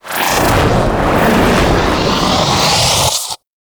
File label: Void Angel Teleport Build Up; Abilities; Warframe; Zariman